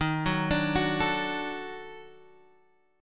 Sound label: D sharp Minor Major 7th Flat 5th D sharp down